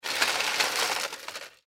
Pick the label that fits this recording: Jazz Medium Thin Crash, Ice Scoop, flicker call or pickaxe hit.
Ice Scoop